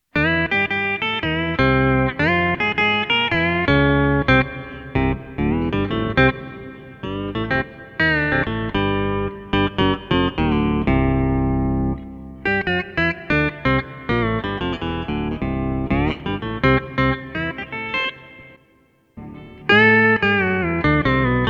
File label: Octave, AXE FX 3